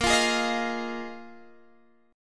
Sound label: Bb 7th Suspended 4th Bb Guitar Standard; strummed; JGuitar